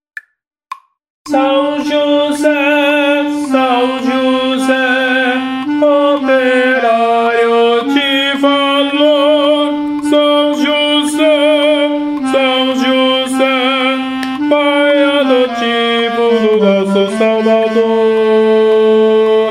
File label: tenor; hino a sao jose